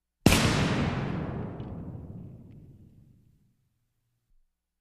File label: Explosion Medium Bang; Technology